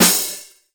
drum hit normal

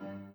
b piano

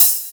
open hat; osu

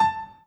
Piano Chord

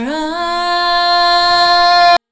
vocal

